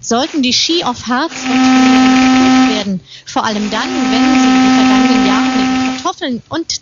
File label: cooler